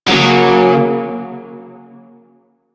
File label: Shawzin Yo Chord I; Music; Warframe; Narmer Shawzin